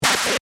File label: Glitch Short Circuit, Glitch, Short, Circuit, Beep; Glitch Sound Effects